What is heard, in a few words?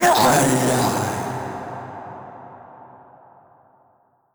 death; Crypt of the NecroDancer